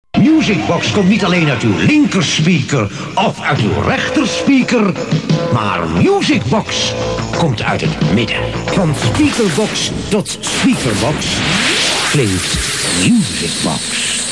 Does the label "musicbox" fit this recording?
Yes